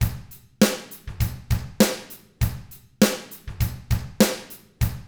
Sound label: ROCK, Drums; ILIO; Double Platinum Drums 1